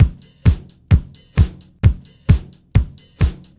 BEAT, Roots Disco; USB Soundscan vol.32; AKAI

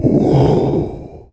IMP WHOOSH

